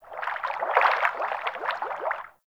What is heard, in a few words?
Fish Tank Movement; Warframe; Hub; Liset Personal Quarters